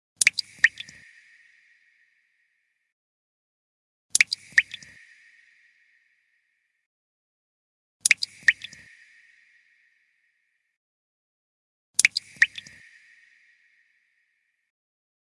message notification sound water drop

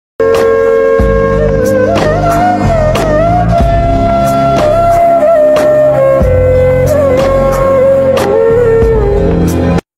sad background

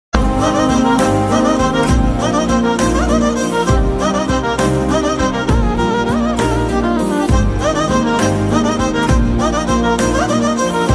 bgm ringtone in telugu; raahu

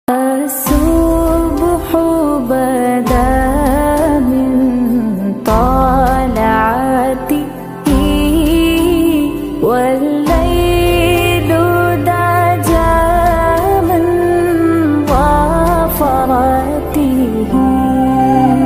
naat ringtone, islamic best naat ringtone

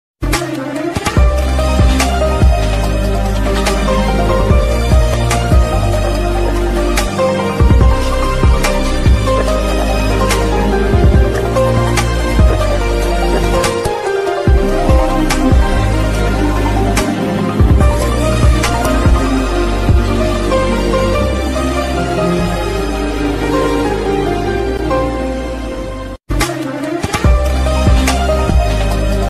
love bgm ringtone, tamil

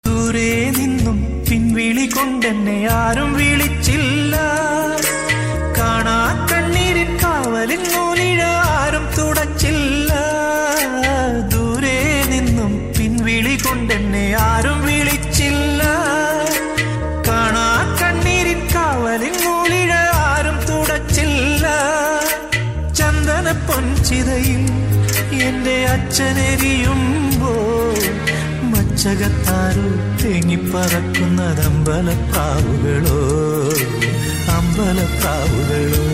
malayalam song; malayalam sad songs

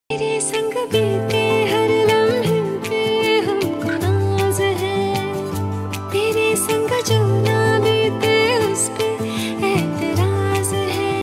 love hindi song; love songs

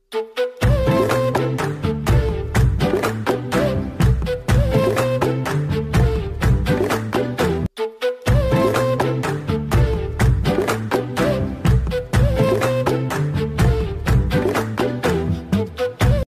song bgm ringtone

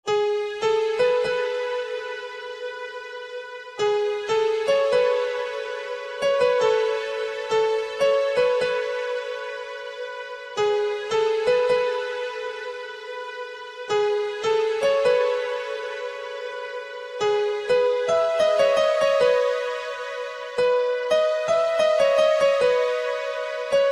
instrumental ringtone love ringtone

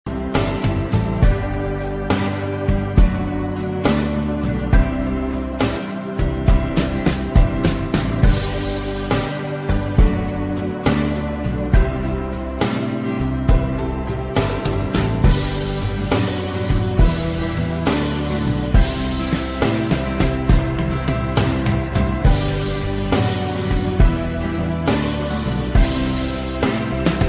ringtone instrumental

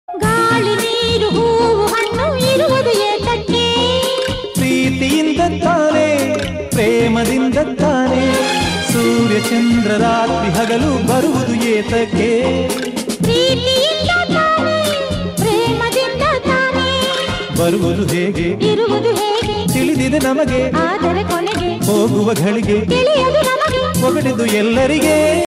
kannada movie song

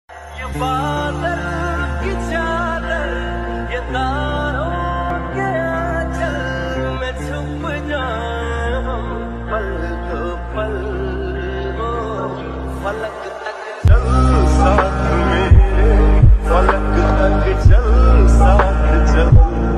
lofi ringtone slowed reverb

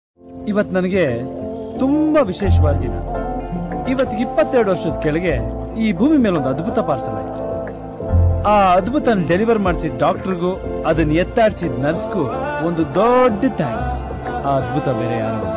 birthday song kannada

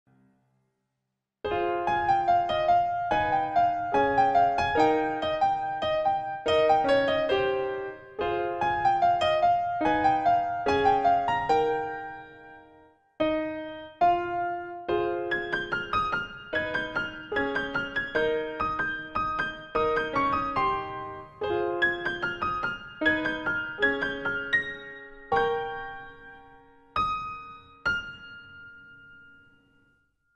piano cover keyboard; tamil keyboard tamil piano

